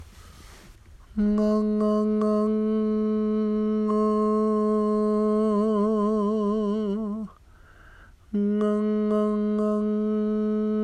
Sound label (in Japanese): ンガンガ 母音